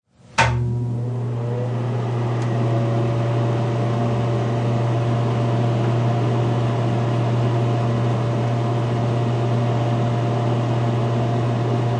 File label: Bathroom fan runs.; Fans; Electronics